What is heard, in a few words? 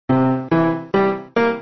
piano